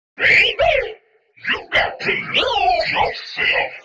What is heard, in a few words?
Vocal Studies